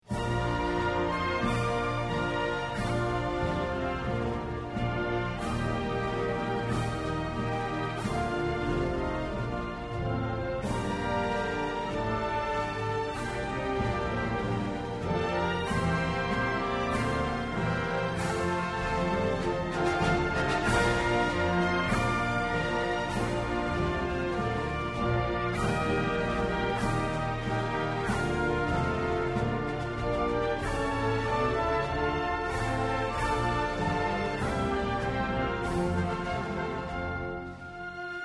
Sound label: Irish National Anthem